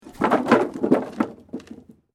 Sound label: WOOD PLANK MOVEMENT